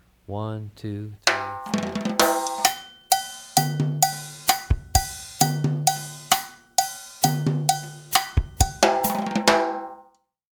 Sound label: cha cha cha basic